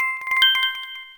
Coin Appear

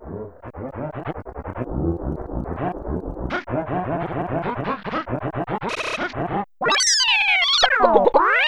erase; super mario paint

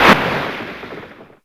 Sand Trap

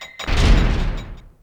Bowser Door; New Super Mario Bros. Wii

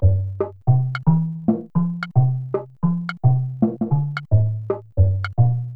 some sort of drum thing; super mario paint

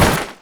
Barrel Break; New Super Mario Bros. Wii

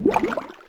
Clam; New Super Mario Bros. Wii

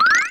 Fuzzy Flip; New Super Mario Bros. Wii

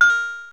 music note; super mario paint

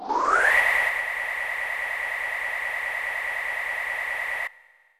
Kirby - Swallowing; Super Smash Bros

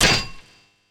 Bumper Hit; Super Smash Bros

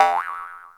Spring Board; Wii

